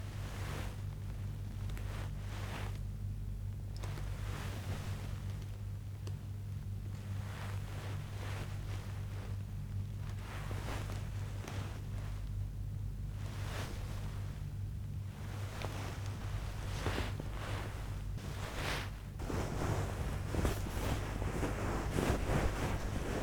Fabric Rustle And Shuffle Foley Various Sounds

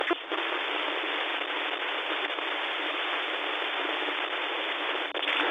Static Error

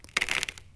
Ice Crack

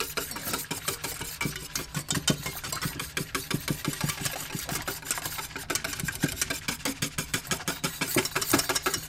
Wisking

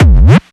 Bass Drum Zipper Kick

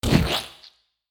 Vocal Splat

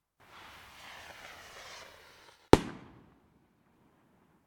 Rocket

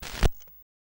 Record Noise

